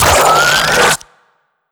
pre explo; slender; attack of the radioactive thing; minion

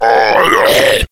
pain, slender shiver

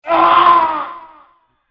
scream

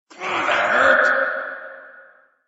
ouch; hl2dm

